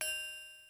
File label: Triangle Open, Yamaha DD-35